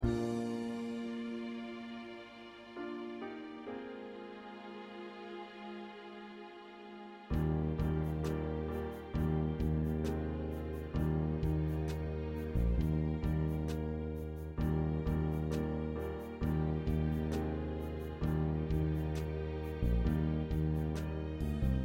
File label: Accompaniment